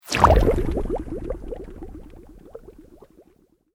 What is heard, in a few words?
Sweep Organic Medium